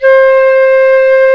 flute, C flute

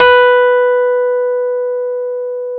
B 1, Electric Pianos; Wizoo

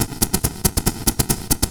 Crushed Perc (140BPM); musicradar

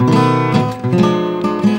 STL STRN BRK; Best Service; World Colours

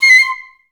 C6, Orchestra; Best Service; Peter Siedlaczek's Advanced Orchestra

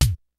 kicks snare, kid; Kid Nepro; Elite; KICKS SNARES

